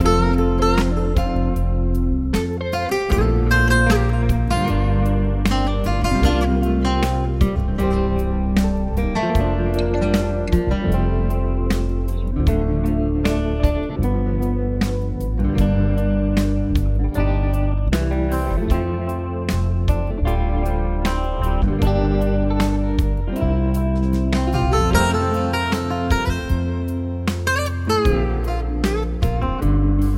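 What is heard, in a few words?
dauntempo relaxing guitar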